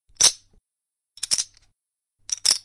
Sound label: chip sound